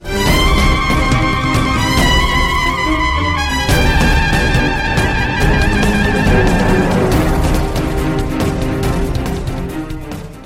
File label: round ten sec count; music; sudden attack; tfgo